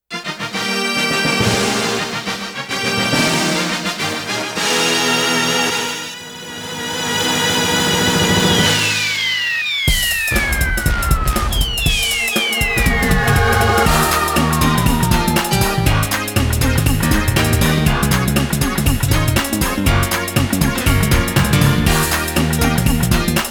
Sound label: Super wonderful fanfare~Congratulations BGM; Namco Game Sound Express Vol.22; Gun Bullet